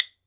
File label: WOOD BONE, Celtic Percussion; E-MU Producer Series Vol. 7 – Old World Instruments